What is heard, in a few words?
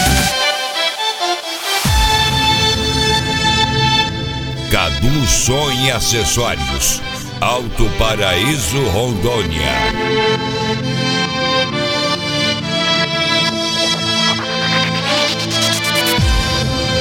gospel